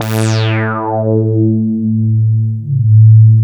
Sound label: Prophet 5 A3; Hollywood Edge - Giorgio Moroder Rare Synthesizer Collection